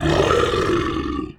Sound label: Great Sand Shark Roar